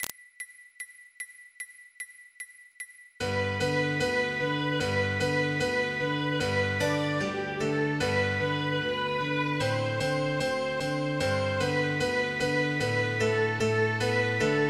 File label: Jingle Bells MIDI